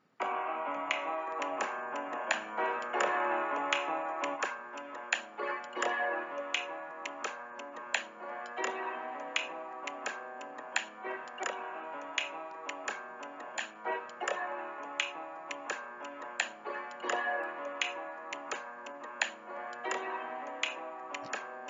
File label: My Beat